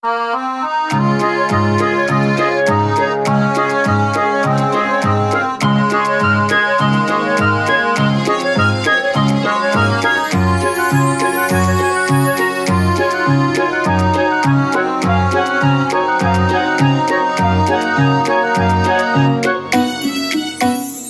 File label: Bgm Fantasy